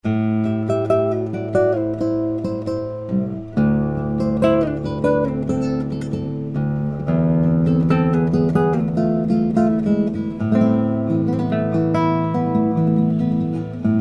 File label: concert 8 string; rodriguez; classical